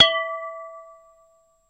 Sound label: Champagne Toast Ding, glass clinks